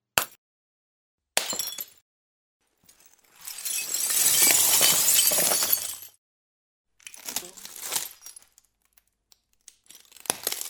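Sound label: broken glass, breaking sound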